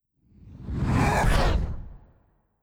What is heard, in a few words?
Skel Drake Spectral Prep